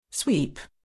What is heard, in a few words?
sweep